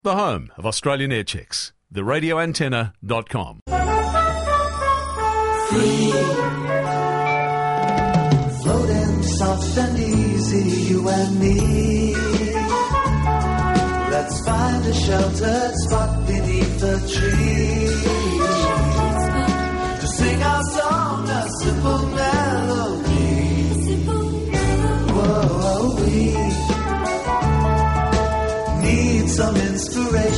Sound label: jingles; Stereo y FM; 1981